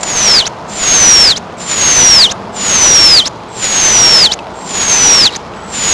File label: Whistles, dolphins; Pandora